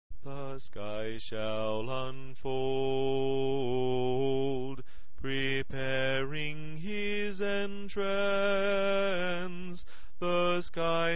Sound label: Alto